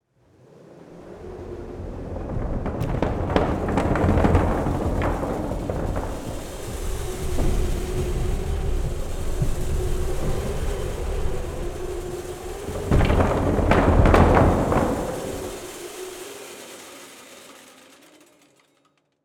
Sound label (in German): Wettermuseum Wettermaschinen Collage